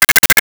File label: Arcade Chirp; Arcade and Games